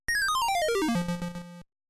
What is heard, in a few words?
lose jingle; CPA Skill Check